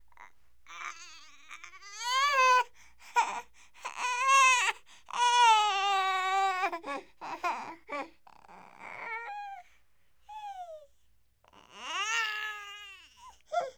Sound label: Crying Baby Light Short